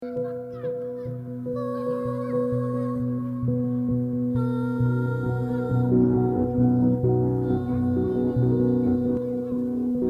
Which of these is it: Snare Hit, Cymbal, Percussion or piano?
piano